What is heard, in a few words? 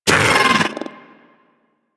bug vocal pain; Warframe; Hunting Critters; Inf K Drive Bug